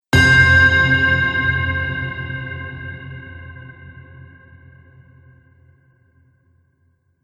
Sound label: Large Reverberating Bell; Bell Sound Effects